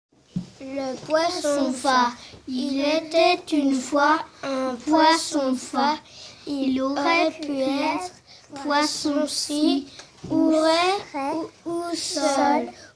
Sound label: Vocal